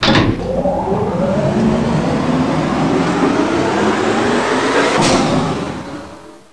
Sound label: servo wind up